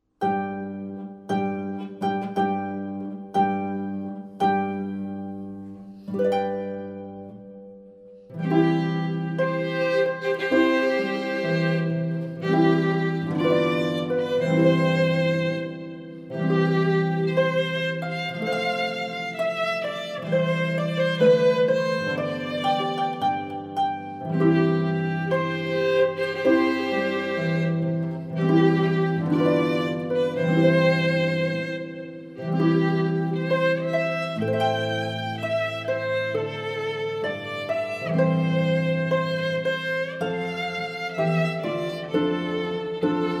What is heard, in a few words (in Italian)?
arpa violino; Wagner; Marcia nuziale dal Lohengrin